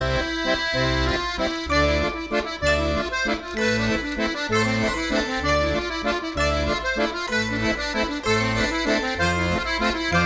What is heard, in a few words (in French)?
La valse